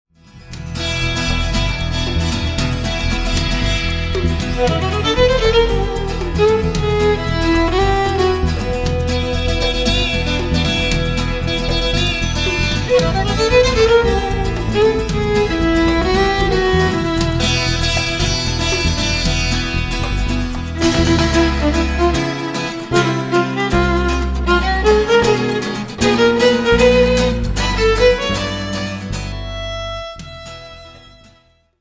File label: cowboy raga